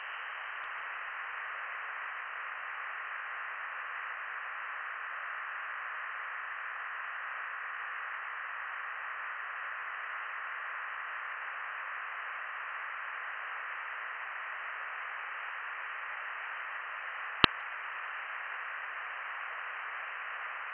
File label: from radio, 7177KHz